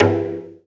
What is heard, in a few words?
Synthesized Perc; Drums; One Shots; Ghosthack; Drum Mega Bundle; Drum Hero 5; Cryostasis